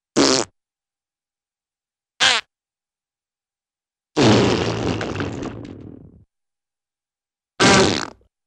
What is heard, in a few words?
Raspberry - Classic 'thppp' Sound Bonk & Deflate; Cartoon; Hollywood Edge; Cartoon Trax